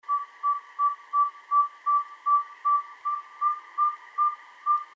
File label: OWL NORTHERN SAW WHET; species/birds; wbba